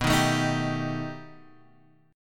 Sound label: B 7th Flat 13th